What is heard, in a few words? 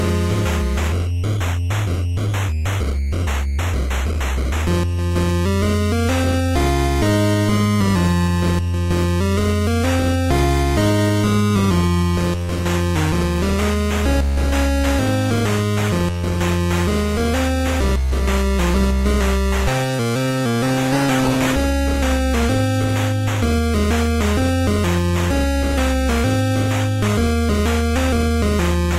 8-BIT